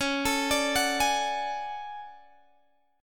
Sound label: D Major Sharp 11th C sharp, chord